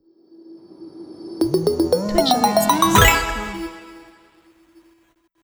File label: Arcade Subscriber